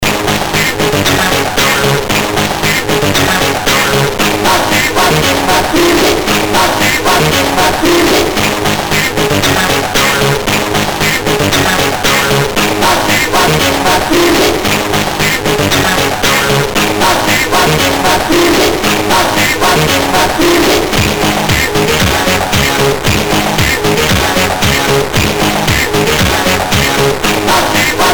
Pop Music, MOS6581R3

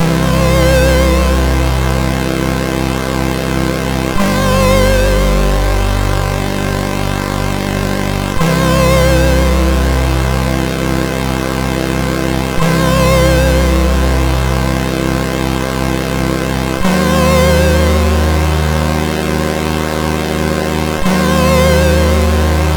E-Load loader, MOS6581R4; hvsc

